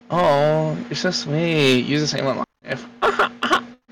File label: laugh; hoss